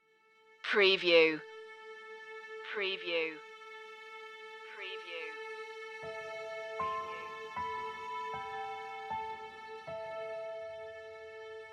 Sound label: gentle lights no music box